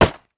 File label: Impact Axe Chop Tree Hit Wood